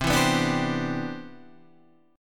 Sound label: C Major 9th, Guitar Drop D